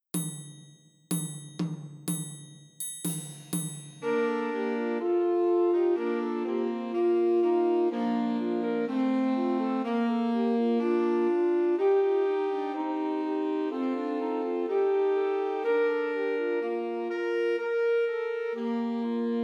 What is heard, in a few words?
Christmas Trios for Two Altos and Tenor Saxophones